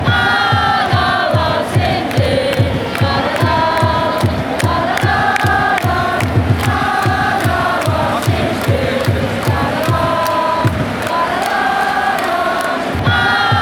dalala, chants

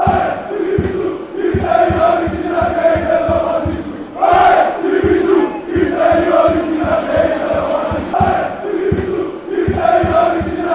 aek athens chant

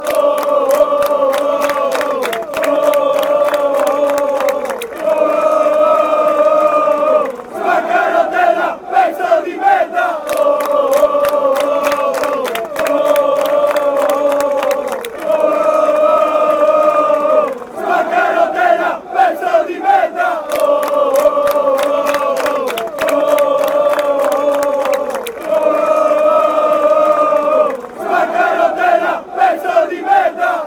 piece fanchants

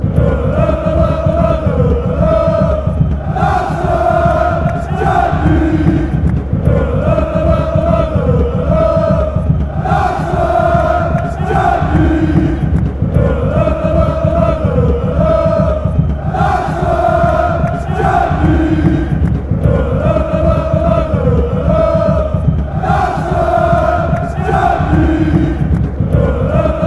chants; nacer chadli

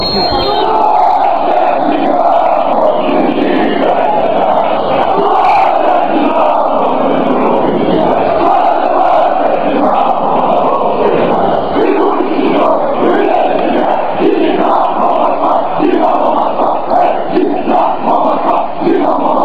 chants; slavsya

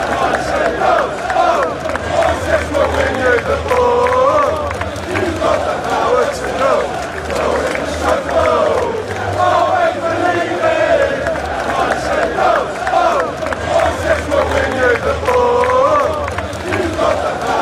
player chant, fan chants; moises caicedo chelsea; united kingdom

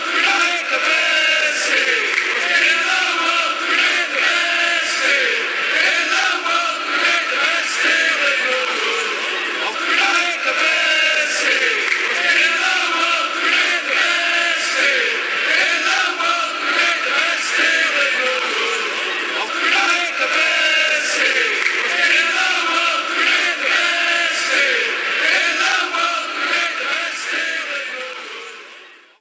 best steel in the world, fan chants

